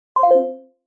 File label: button